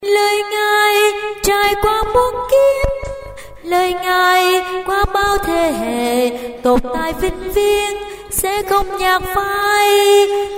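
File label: soprano alto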